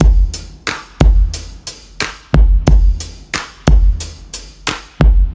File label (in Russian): Simple Drums, электро-джаз